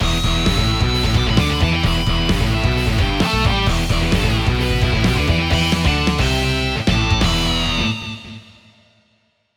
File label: weapon get; cryorage; svcino